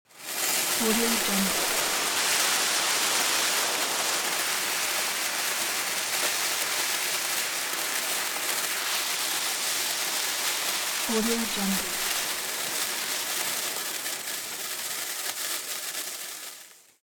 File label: burning wick